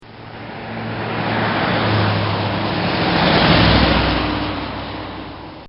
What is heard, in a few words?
Car Driving By; Cars; Vehicles; Bobby Cole